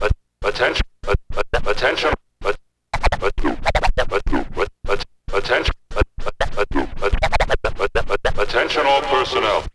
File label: Scratch; Matt Hoffman's Pro BMX 2; XBOX